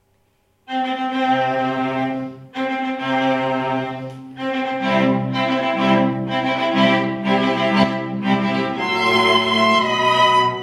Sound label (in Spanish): Marcha Nupcial Mendelssohn, cuarteto